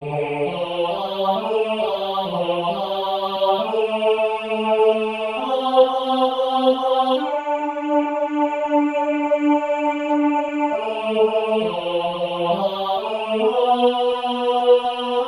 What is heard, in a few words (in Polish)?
Tenor Solo; Slawcie Usta Cialo Pana 4L